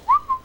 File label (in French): siffle, humains